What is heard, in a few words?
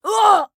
flyer death